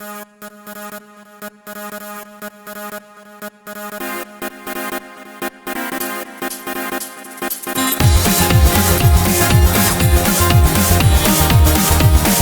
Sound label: Chiptune Train